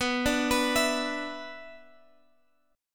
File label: B-Minor Double Flat 5th, Guitar Open G